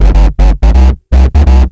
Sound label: BASS SYNT; BASS SYNTHES; AKAI; Big Beat Samples & More